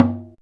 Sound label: LONG DRUM, ETHNIC DRUMS; Zero-G Ambient 1